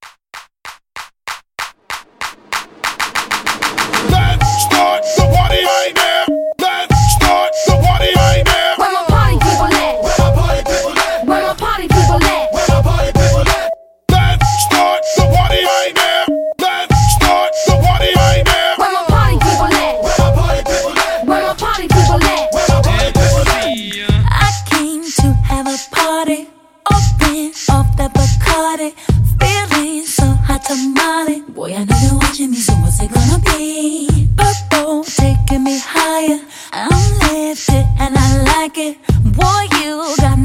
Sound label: Hype Party Starter